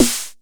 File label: Snare, retro house samples, Drum Hits